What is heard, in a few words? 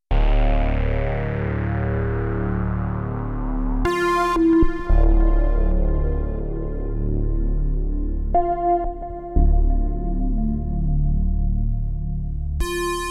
Waldorf Rocket Dual Pulse Rectangle